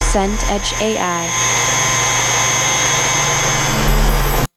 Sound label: subway arrive